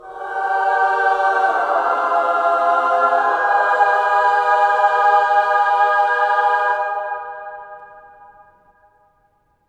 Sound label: MINOR WOMEN